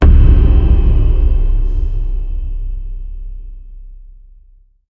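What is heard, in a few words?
cinematic trailer piano hit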